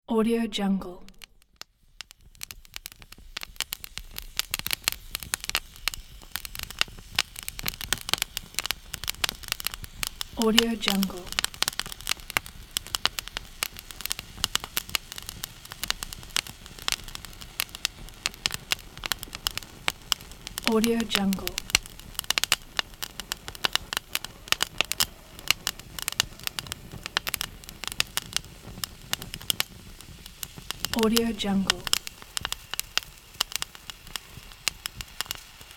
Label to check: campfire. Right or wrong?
Right